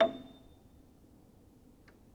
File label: Upright Piano; HSS OP Pack; healing soundscapes